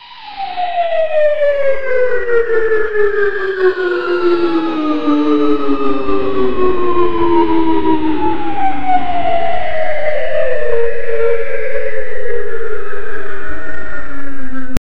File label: drift effects